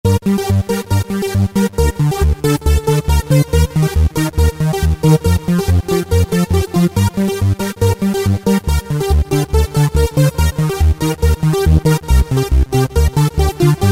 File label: Seq Saws; Roland SRX-05 Supreme Dance